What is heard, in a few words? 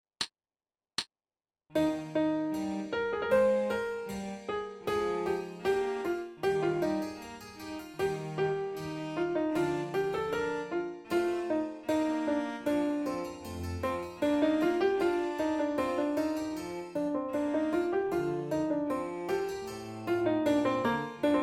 Allegro - Slow Tempo with Solo, Alto Sax; Frederick the Great - Adagio and Allegro